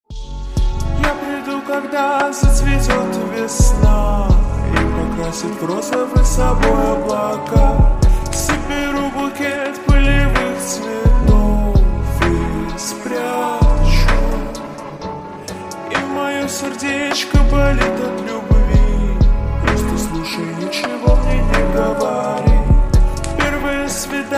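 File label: slowed reverb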